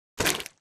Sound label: bullet light ballistic mud debris; Titanfall 2